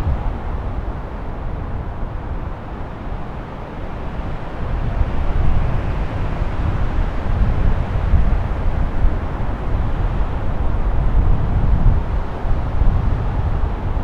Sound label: Ambience Wind Low